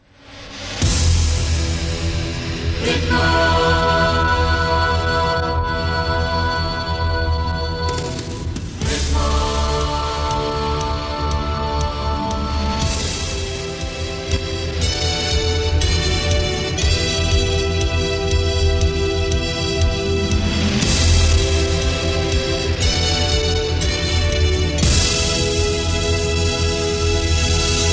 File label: jingle; Ritmo